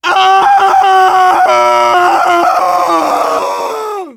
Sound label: fall, survivor voice biker